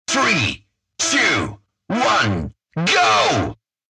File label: countdown